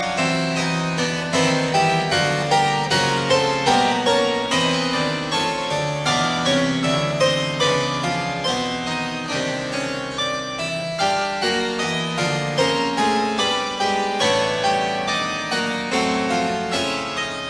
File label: version for keyboard Fugue and Resume; Cowell; Set of 4